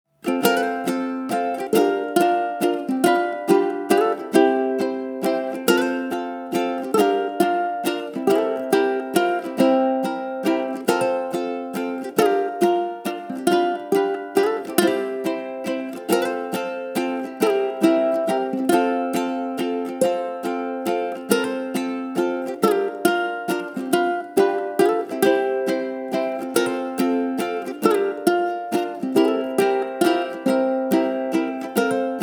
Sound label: Waltz